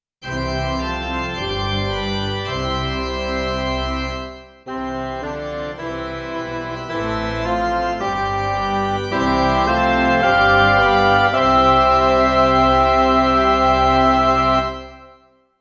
mass amen midi

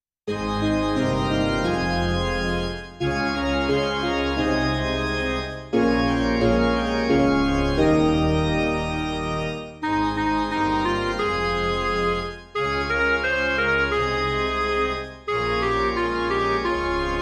alleluia midi

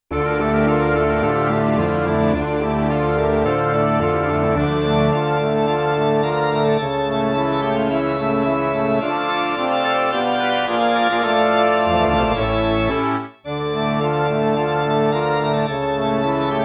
midi

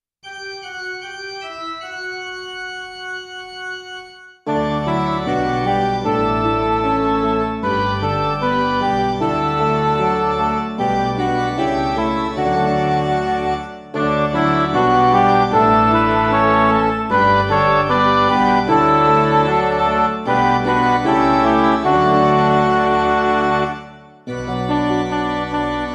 midi

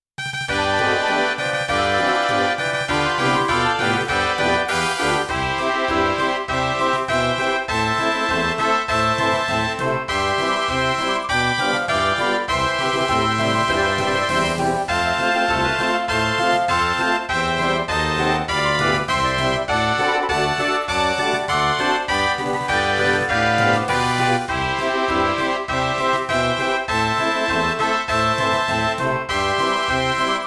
midi

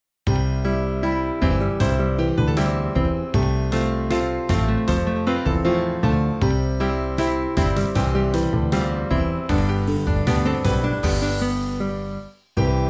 midi

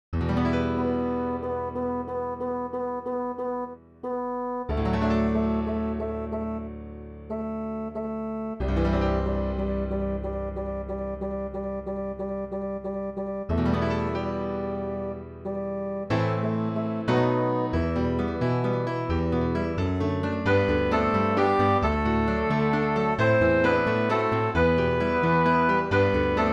doxology and amen english midi

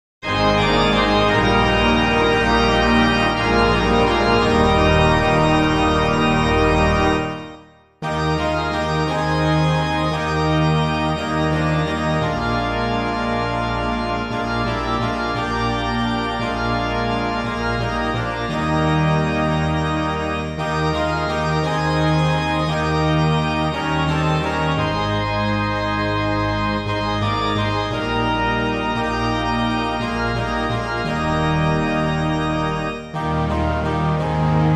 midi

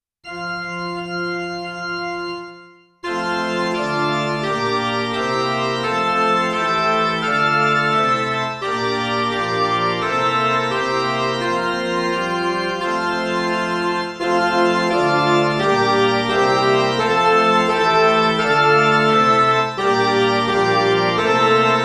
midi

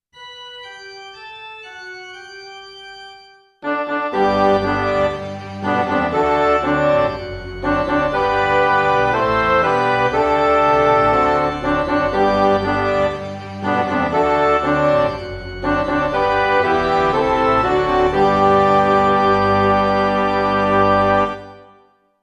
gospel acclamation midi

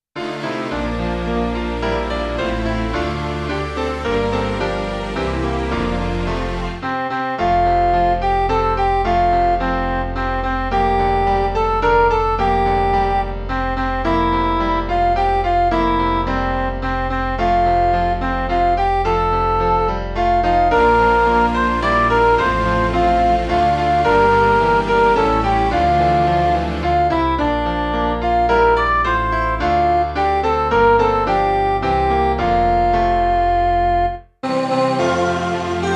midi

